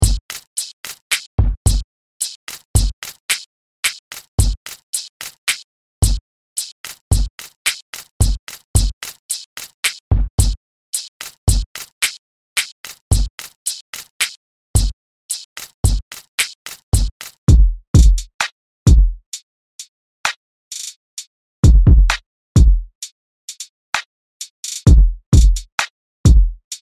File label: DRUMS; APEX